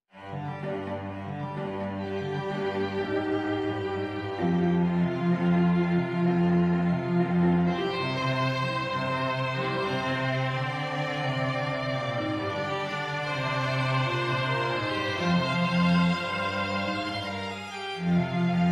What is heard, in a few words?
string Vignette